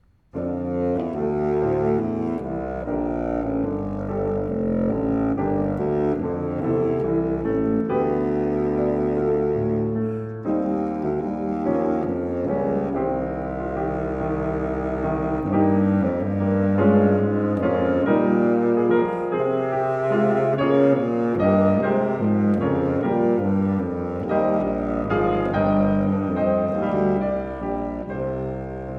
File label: Tempo di Siciliano